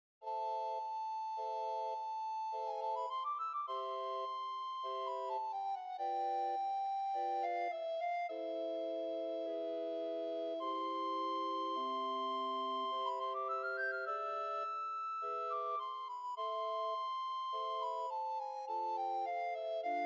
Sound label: Bamboo recit